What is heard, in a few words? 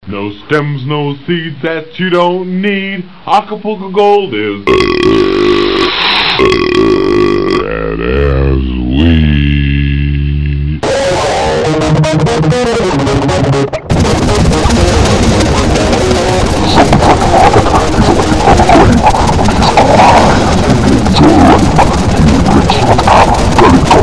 zombie drunken